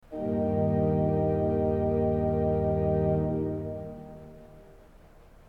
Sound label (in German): ton, orgel